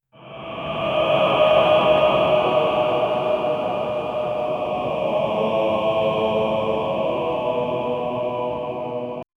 modi modi chants

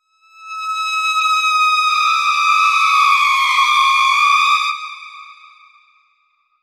rising aggressive strings

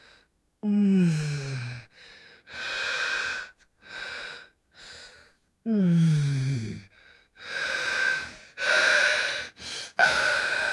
years old man groaning; 18 years old

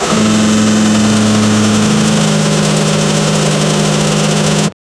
drift car engine 5000rpm

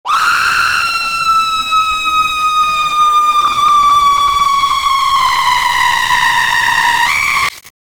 scary screamer sound, screams; sound effect

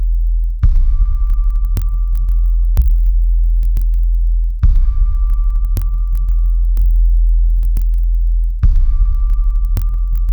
a moody west coast noir b; shadowy

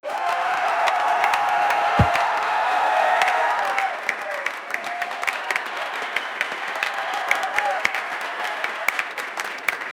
people chanting hey; 10000 people chanting hey

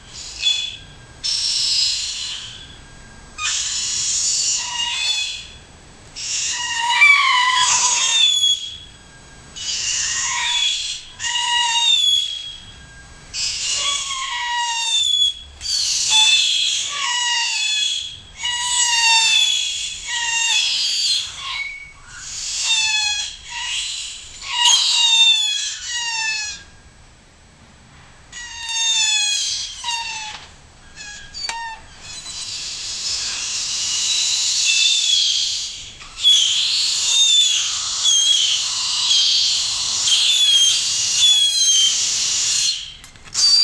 startling sound to scare

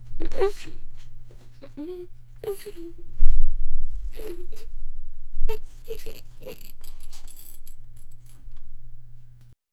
girl trying to lift heavy, effort

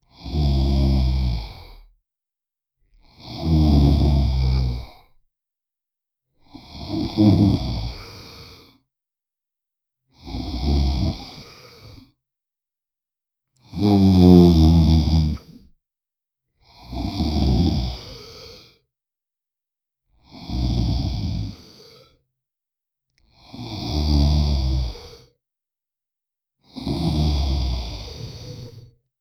a male vampire snoring

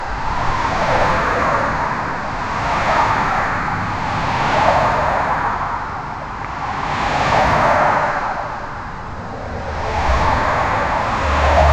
german autobahn ambience

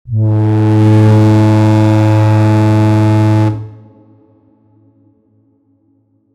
battle horn echoes far aw, landscape

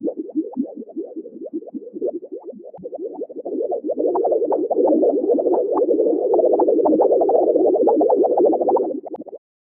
floating through a galaxy

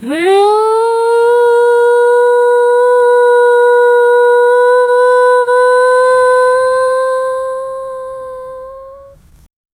folk woman long high pitc

